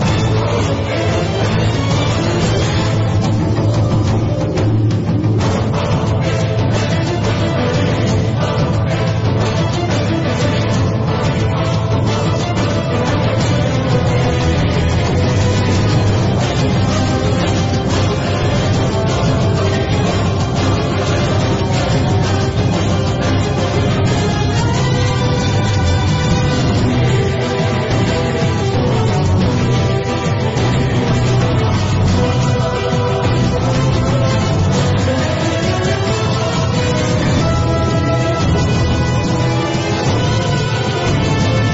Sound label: Battle BGM; Localdia Chronicle; Android game music